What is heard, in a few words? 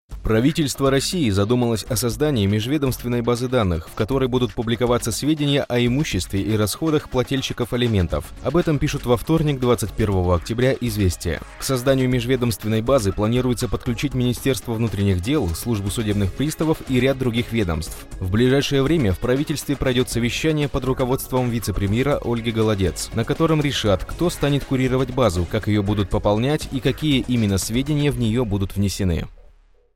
News music